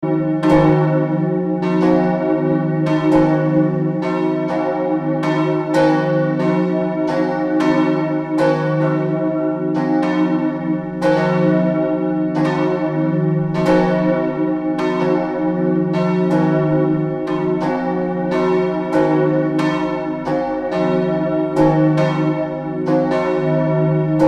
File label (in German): glocken von steinwedel